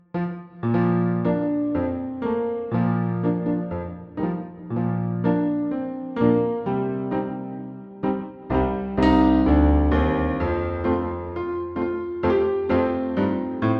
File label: Jingle Bells